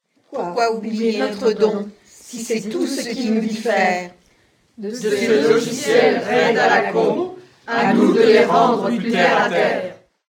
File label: ping; nantes; breil